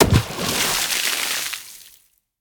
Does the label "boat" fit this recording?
Yes